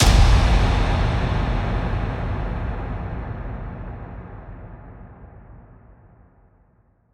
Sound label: Impact